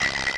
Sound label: Multiple interface error tones at various pitches.; Error, Interface Pitch, Tone Web Efx, Error